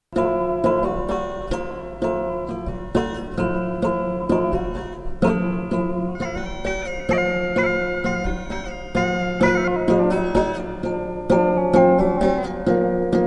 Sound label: electric berimbau digital process